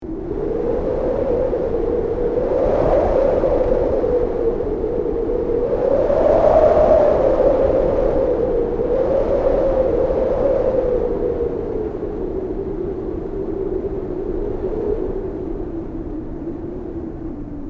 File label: winter wind